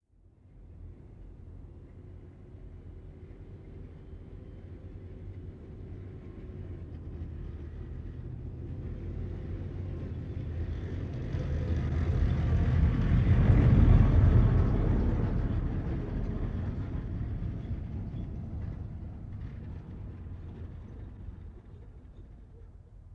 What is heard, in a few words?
APC By Medium distant, armored personnel carrier